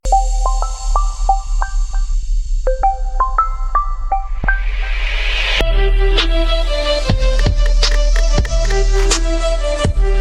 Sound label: Vivo Ringtone